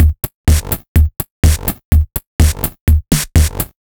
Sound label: Feel My 54hz, Drum Loops, 125 BPM; Sonic Foundry (Sony Creative Software); Underground UK House & Electro